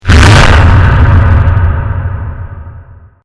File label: thud